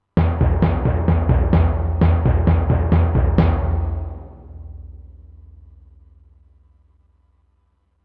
timpani main melody

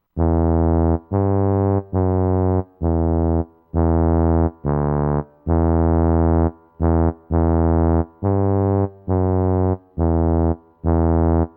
tuba main melody

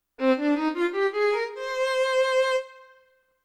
violin short

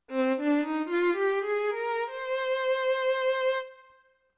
violin short

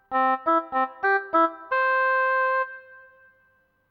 oboe short

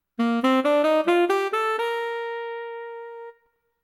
saxophone short

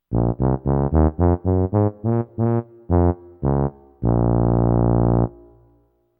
tuba short

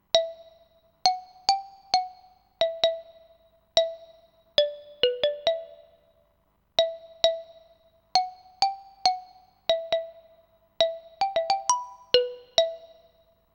xylophone main melody

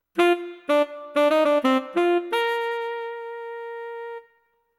saxophone short

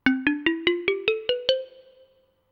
xylophone short

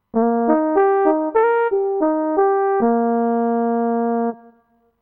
trombone short

